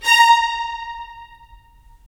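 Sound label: strings violins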